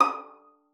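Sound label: violin pizzicato D#6; Xsample VOL-5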